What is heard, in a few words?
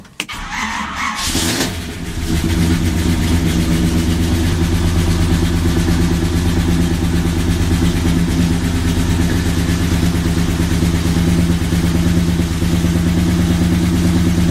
Startup; HevyChevy